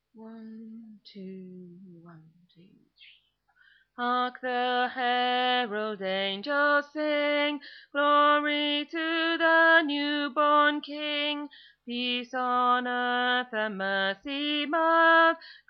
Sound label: Tenor